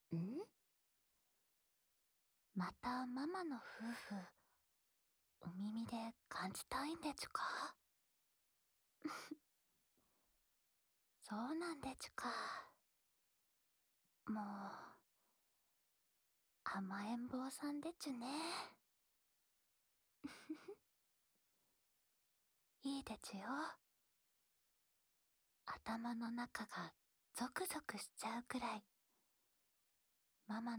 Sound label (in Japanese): 安眠用お耳ふーふーループ（右耳, ASMR